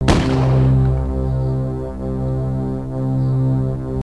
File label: death; singe